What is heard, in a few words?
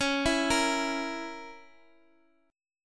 C sharp Minor 6th C sharp down Guitar Drop D